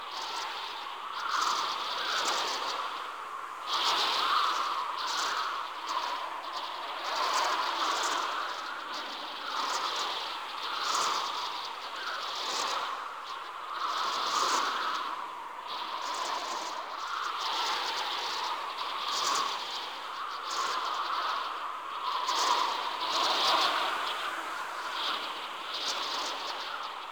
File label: Generic Wind Loop Slow; Vehicles; Archwing; Eidolon